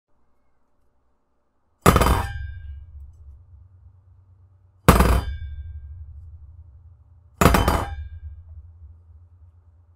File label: bottle fell